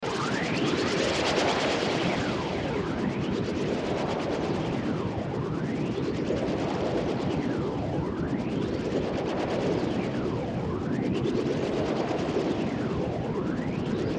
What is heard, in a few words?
Sci Fi Bed Flange Phaser Warble